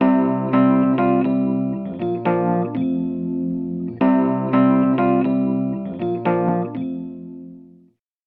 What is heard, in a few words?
e-piano 2.7khz 8db; softube abbey road studios brilliance pack; rs127 box